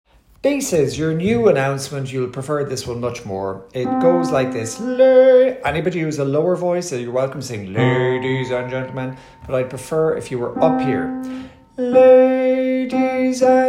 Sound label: Bass; Announcement